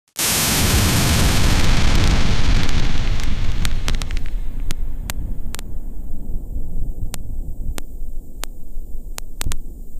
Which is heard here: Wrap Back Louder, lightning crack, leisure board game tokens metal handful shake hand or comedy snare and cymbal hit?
lightning crack